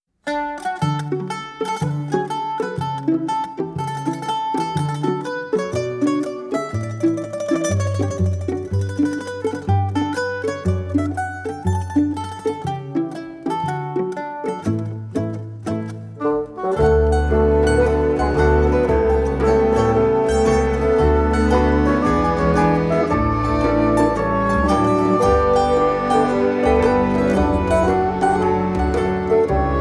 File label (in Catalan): andante con variazioni en re major per mandolina i piano; woo44 num 2 andante